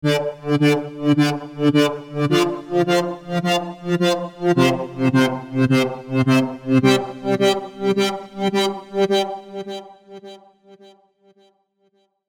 serum seqs arp; chiqui audio

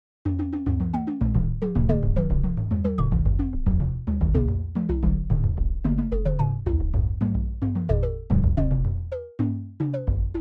indian percussion khol mrdanga

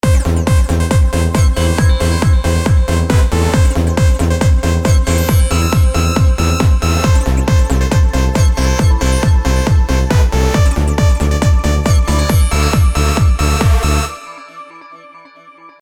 hard trance

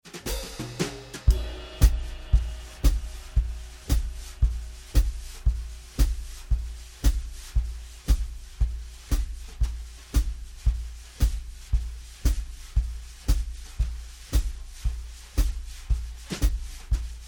115 jazz full mix

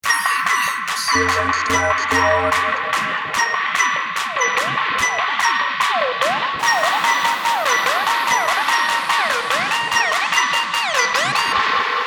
trap

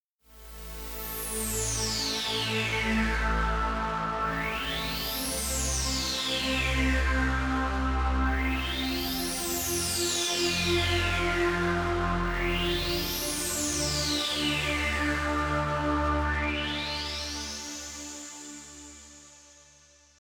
spatial sweep pad ii

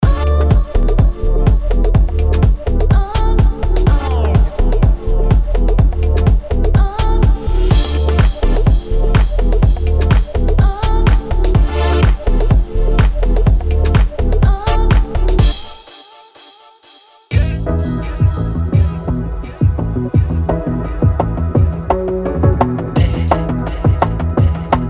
vinyl; vinyl revolution vol 2

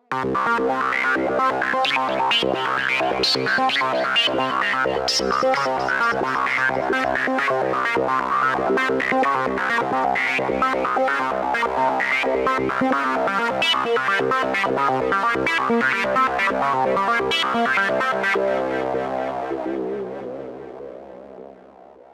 melodic enchantment

